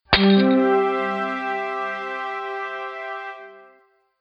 Wall Destroyed Success Sound, video game sound effects